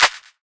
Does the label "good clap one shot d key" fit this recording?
Yes